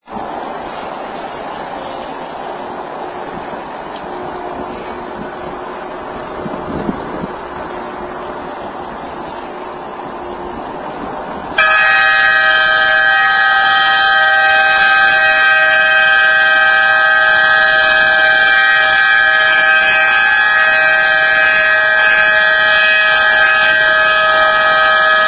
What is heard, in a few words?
Red Alert